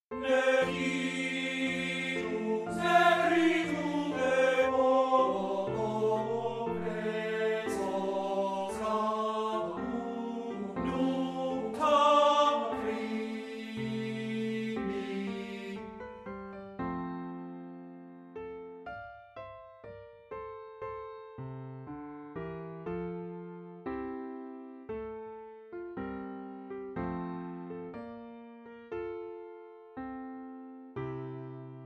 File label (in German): Wiegenlied Lied per voce e pianoforte; Schmittbaur